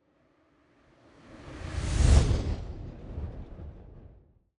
Whoosh, Airy, Full, Soft, Shudder, Very Slow, Aftershock; Sound Design; Airborne Sound; Airy Whooshes